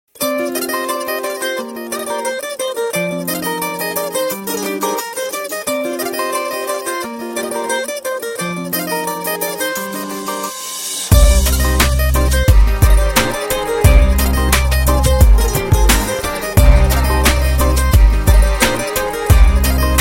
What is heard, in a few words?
Punjabi Punck